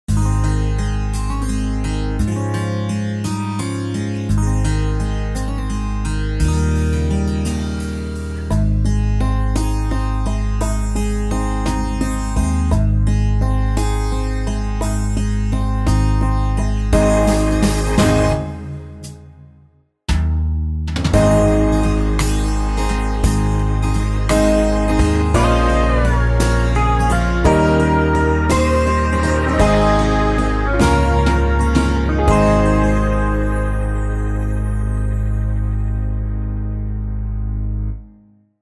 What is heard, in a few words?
6/8 guitar ballad